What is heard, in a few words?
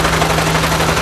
rotor 2blade; fgdata